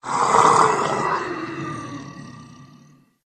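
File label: Monster Roar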